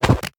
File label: Crab Roll Over; We Are the Dwarves